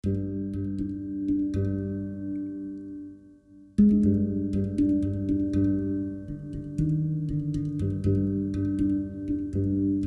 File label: dark night story; agsoundtrax